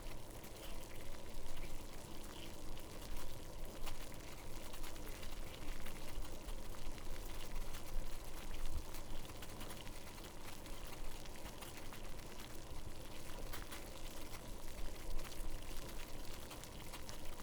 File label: water boiling in pot